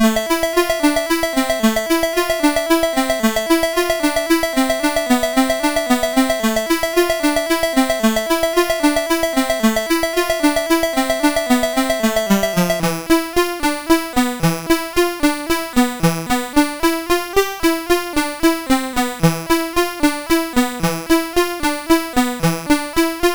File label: Spanish Study, MOS6581; MOS6581R2